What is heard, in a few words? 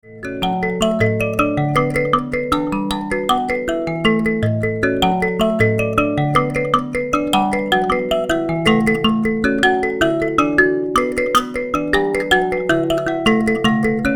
mbira